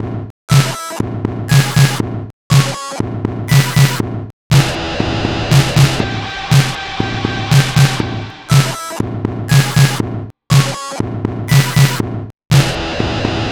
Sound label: Sinewave